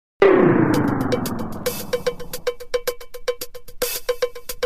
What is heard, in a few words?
Electro